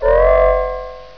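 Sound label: DOOR OPEN; Sonic R-SAT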